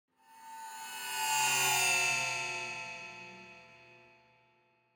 Mind Bender Stingers; Cymbals From Hell

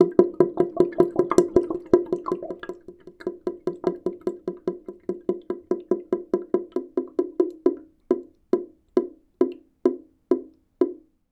Drain Suction; The Sound Pack Tree; This Library Sucks

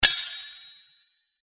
Sword Sound; FXHome; Fighting Sounds

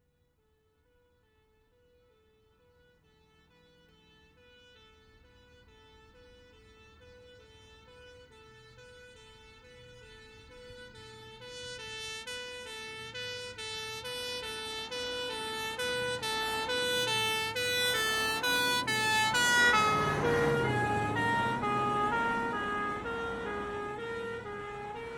Fire Engine, Pass R To L With Two; Emergency; BBC Sound Effects Library

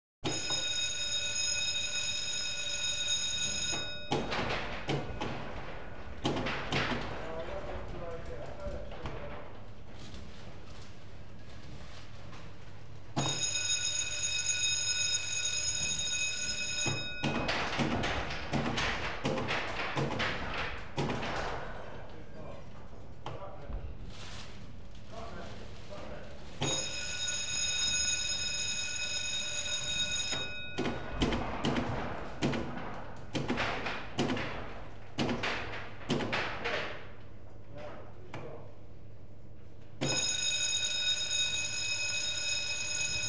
Printing Machinery AND Copiers; Computers Printers Phones; BBC Sound Effects Library

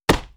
Hit; NoizBoy; Hit Kit 1

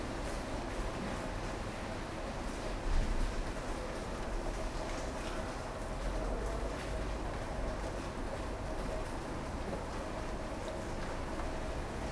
rain traffic; 99Sounds; Rain And Thunder

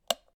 switch, UI Audio; Kenney's Sound Pack

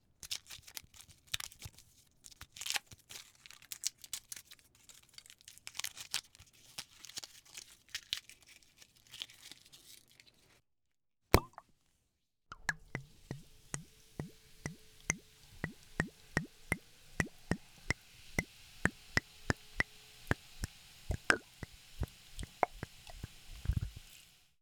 Wine bottle open pour; Bits, Scraps and Pieces Super Pack

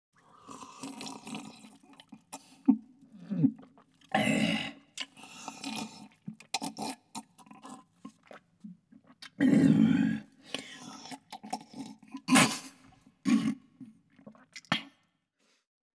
Drinking From A Bucket; BBC Sound Effects Library

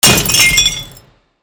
mixed impact; 3maze; IMPACTUS

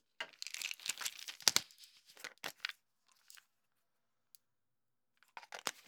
Aspirin, Pill, Bottle, Open, Collect Pills, Close; UberDuo; The Bathroom Audio Playset

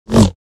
Saber; FXHome

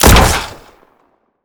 gun rifle sniper shot; Gamemaster Audio; Gun Sound Pack

